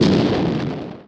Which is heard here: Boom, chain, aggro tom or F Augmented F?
Boom